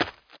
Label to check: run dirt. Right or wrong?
Right